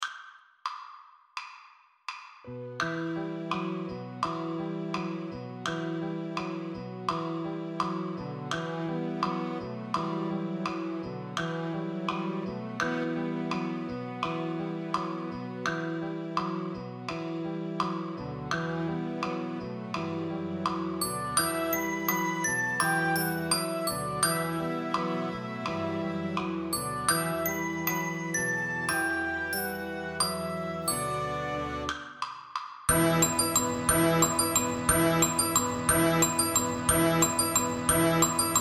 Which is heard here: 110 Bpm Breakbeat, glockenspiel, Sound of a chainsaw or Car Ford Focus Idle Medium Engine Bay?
glockenspiel